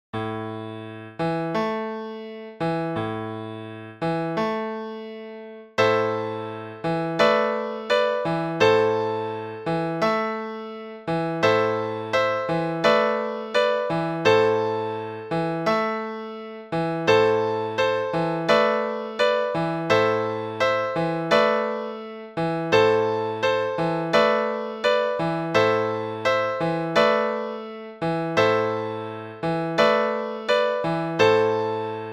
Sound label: sleep baby sleep lullaby for midi minor major